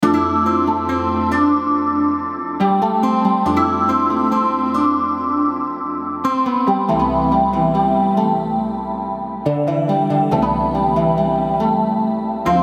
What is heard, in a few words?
Keys; Parade; Unison Ice'd Out One-Shot Collection (Omnisphere)